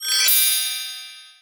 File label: Level Unlocked; candy crush saga gamerip